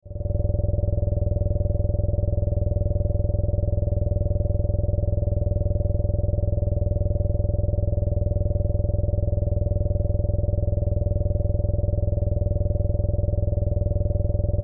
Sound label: Drone, Modulation, Flutter, Midrange, Scifi; 5.1